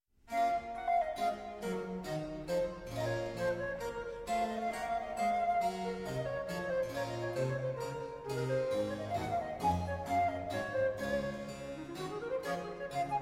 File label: Cantata; Schweigt stille, plaudert nicht; Coffee Cantata; J.S. Bach